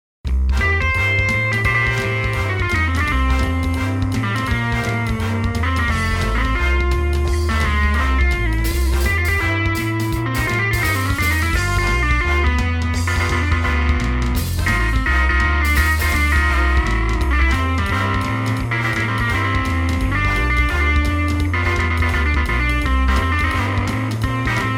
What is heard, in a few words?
Midi blues